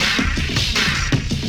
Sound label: Loop, Drum & Bass, 160 BPM